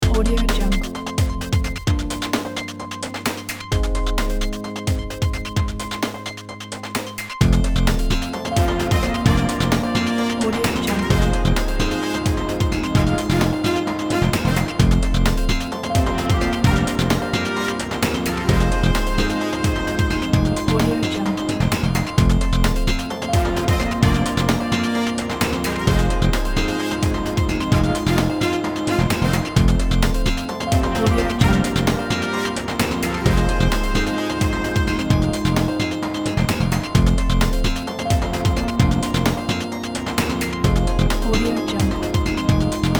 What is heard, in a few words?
professional news; creativemusicfactory